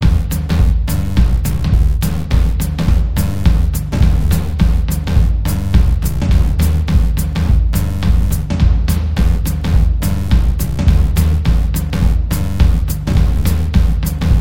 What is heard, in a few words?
The Canteen Music Pads (Low)